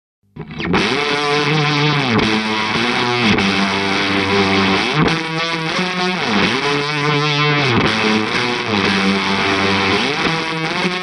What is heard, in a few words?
Gain Max over the top; Korg Nu Tekt HD S